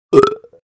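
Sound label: sound of burp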